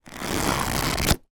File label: foley paper post it notes pad flick pages; zapsplat